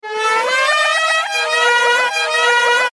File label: horn, reverse